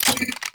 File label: Reload; Warframe; Weapons; Corpus; BFG